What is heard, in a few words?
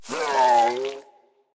minion death grunt; smite; npc; roman archer